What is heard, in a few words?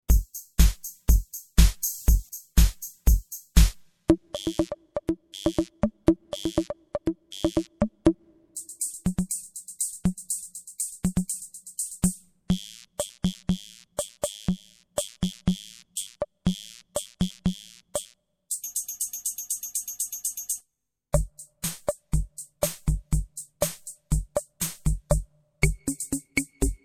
some Drum-Machines